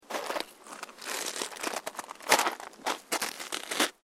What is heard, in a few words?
SNOW CREAK